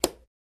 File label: Light Switch Wall Switch; switches